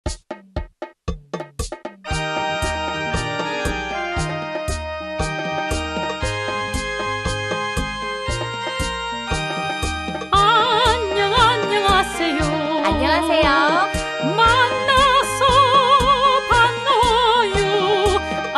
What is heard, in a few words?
korean arirang greeting song